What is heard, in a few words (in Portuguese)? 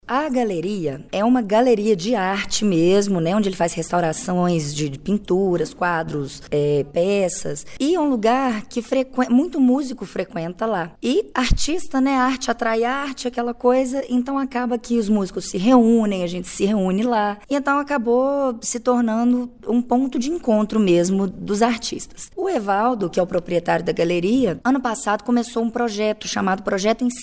bateria